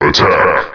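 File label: attack boost; PC Gamer IT CD 41; Daikatana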